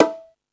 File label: Latin Drums, E-MU Pro-Cussion